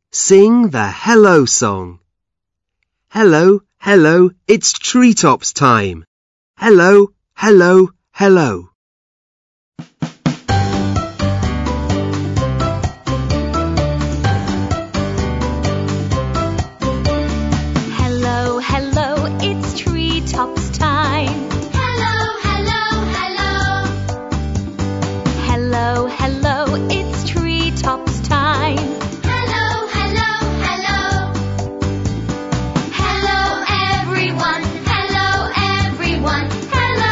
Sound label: The hello song